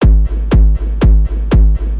Beat

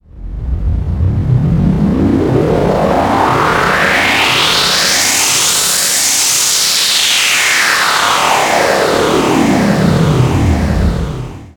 Second Delay Whoosh

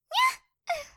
Gasping Then Falling

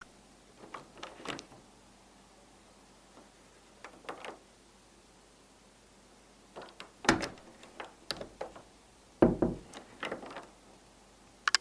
Open Close Door Quietly

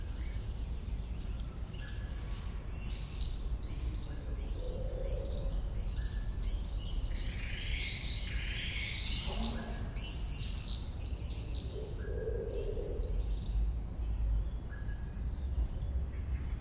Swifts

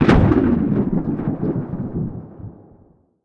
Fire Deploy Shot

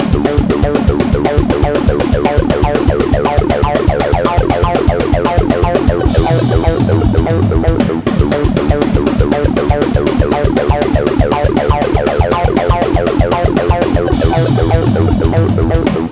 Trance And Metal Loop

